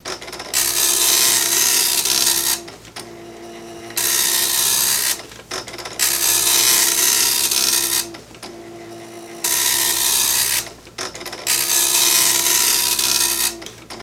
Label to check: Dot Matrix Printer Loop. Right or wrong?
Right